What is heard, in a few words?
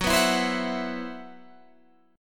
C Major 7th Flat 5th F sharp